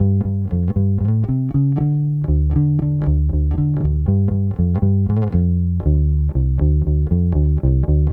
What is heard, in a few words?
Bass, 70s Analog Funk